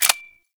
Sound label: Glass Shotgun Fire Stop B; Warframe; Tenno